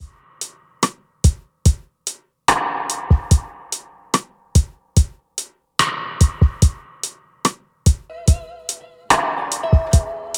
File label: Rim Steel; TD8BB